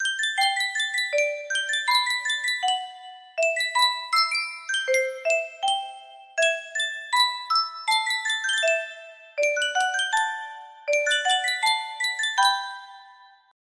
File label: sankyo music box